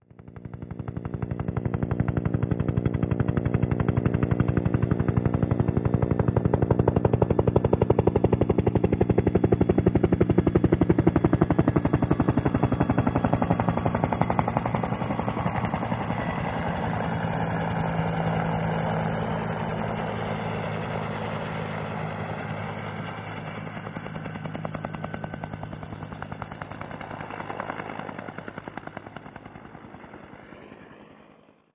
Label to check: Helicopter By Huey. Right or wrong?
Right